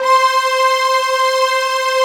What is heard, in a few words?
huge stack; Greytsounds; Scott Peers Sound Engineering